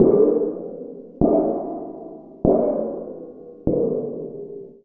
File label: Steel Sheet Bent Mallet; Metal; Sonic Foundry (Sony Creative Software) - Ambient Atmospheres & Rhythms; Ambient-Metals